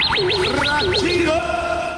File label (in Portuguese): vinheta; ratinho